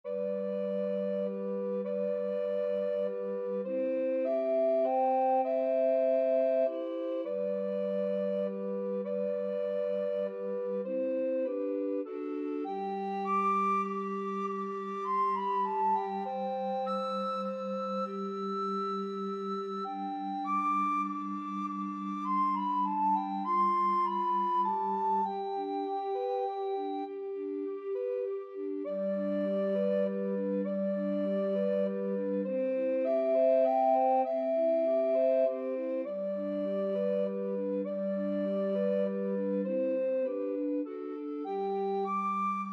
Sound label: lavenders blue, recorder quartet